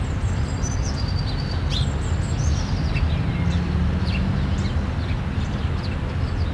city; norleans

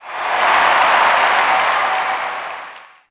Cheer, Crowd, reactions

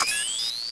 console Type Confirm

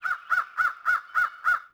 Crow Bird; ambience; Project Gotham Racing 2

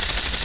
eng high, ships engine; Quantum Redshift; XBOX; leah

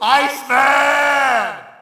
Shout, audience; UFC Tapout 2

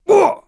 hurt; True Crime Streets Of LA; XBOX

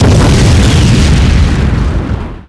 Large Exp, Explosions

